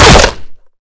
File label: hit head; tacz; daffas arsenal